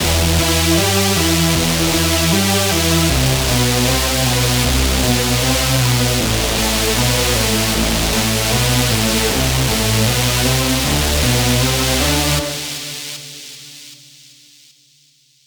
lead loop Emin 155; Music Loops; GhostHack

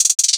Double Trill Hat Roll, 160 BPM; Cymatics; King Drum Loops

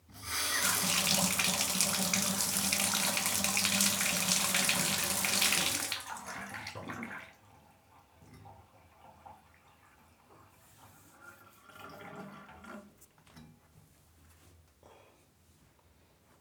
Bathtub Quick On Off Drain; Fairlight Sound Lib

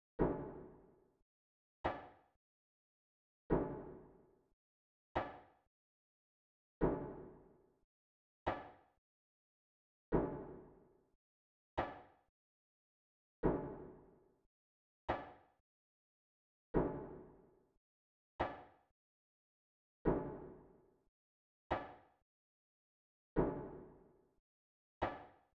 145 BPM F# Min Percussive Hit; Melodies; Loop Stems; Cymatics; 2022 Melody Mystery Expansion; Overdrive

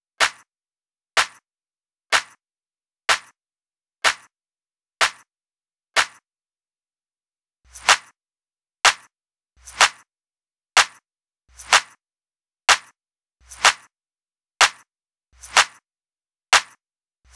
Drum Loop - 125 BPM Clap; Loop Stems; Cymatics; Rainbow Road Drum Loop

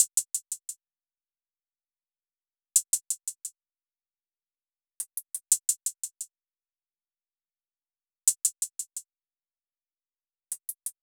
Drum Loop, 87 BPM Hihat; Loop Stems; Cymatics; Vibes II Drum Loop Collection; No Reason Drum Loop

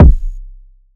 Kick; Cymatics; GHOST (Beta Pack); Drum Kit

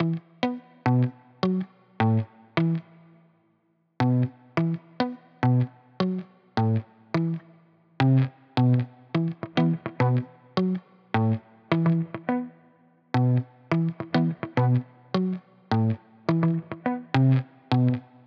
105 BPM E Min Muted Guitar; Guitar Pack; Loop Stems; Cymatics; Miami Guitar Pack - Pt 1; Frisbee

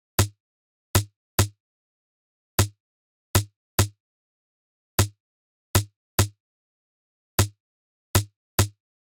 Drum Loop - 100 BPM Percussion, Afrobeats; Loop Stems; Cymatics; PARADOX II - Drum Loop Collection; Duppy Drum Loop

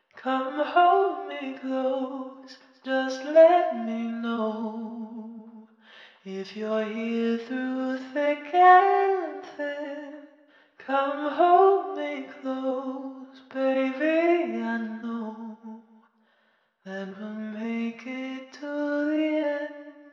Vocal Phrase, 90 BPM G Min; Thick & Thin; Cymatics; Infinity Vocal Collection

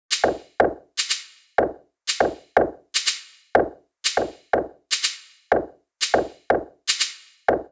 Perc Loop 122, Afrohouse; Afro Drums; Kits; Ghosthack; Drum Mega Bundle

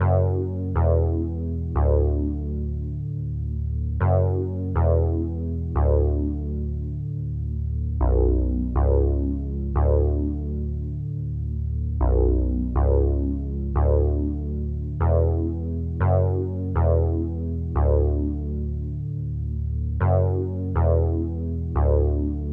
120 BPM F# Min Bass; Melody Loops; Escalade; Retro Collection; Cymatics; ANALOG EVOLUTION Beta

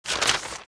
Book Page Turn; Game Essentials

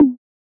Perc - Disco; Drum One Shots

